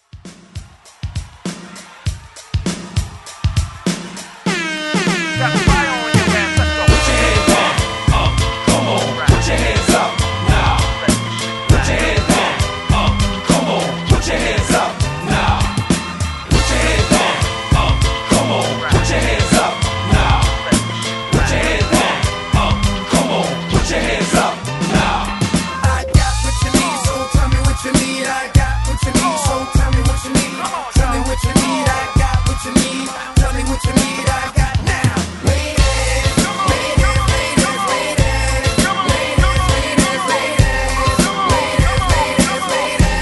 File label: Throw Back Hype Redrum 100Bpm; DJDOLLS